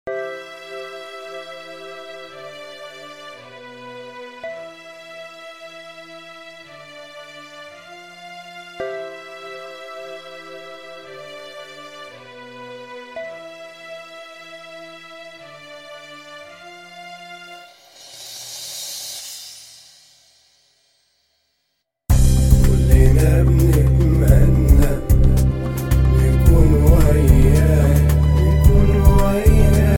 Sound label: without autotune